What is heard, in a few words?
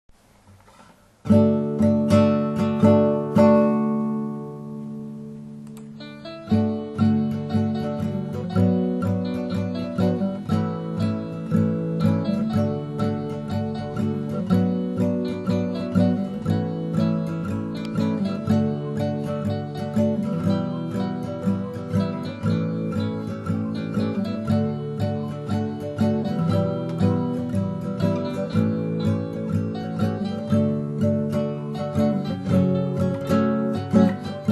Instrumental